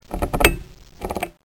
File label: switch